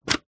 household mains plug adapter australia to uk unplug